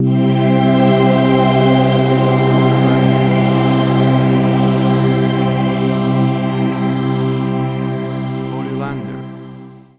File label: Atmosphere of Dreams Stinger